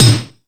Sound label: All Snares, E-MU Pro-Cussion; drum machine